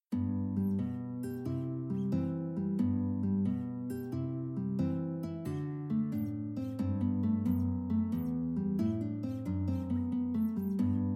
picking

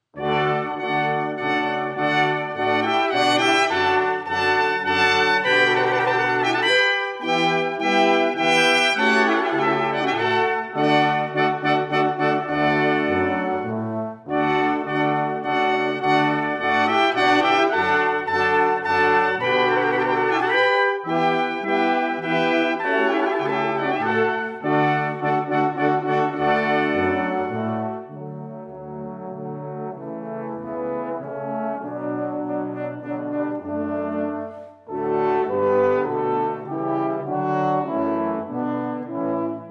minuet; Water Music Suite in D

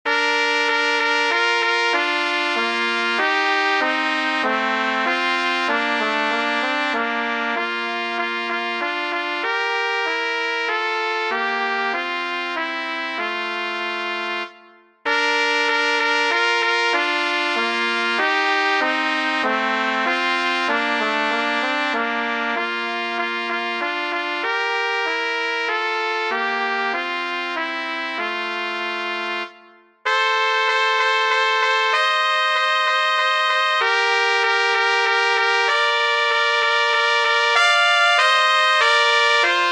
trumpet duet menuet

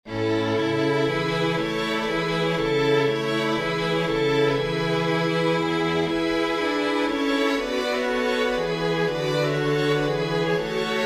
coro for winds strings; haendel georg friedrich; from the opera giulio cesare egitto

